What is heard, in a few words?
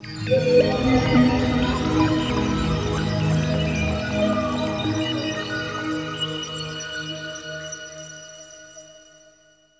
Guitar Textures